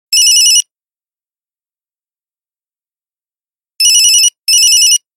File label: Wasp, Nokia 515 Ringtones